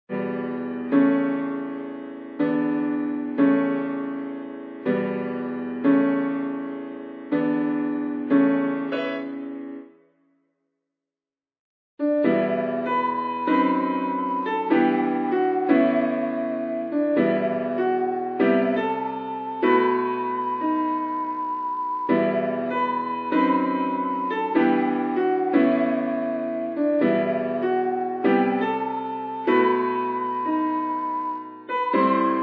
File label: God Rest Ye Merry Gentleman Jazzy